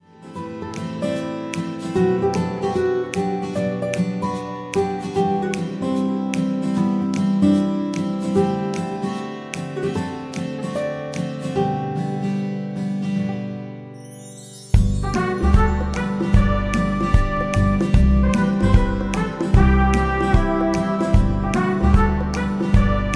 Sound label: Advertising Relaxed Pleasant Atmosphere; MIUI12